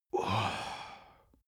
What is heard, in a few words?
male relief sigh; soundpool